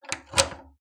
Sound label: Door key lock; Phasmophobia